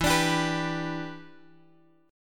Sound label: E Minor 7th, down, Guitar, Drop D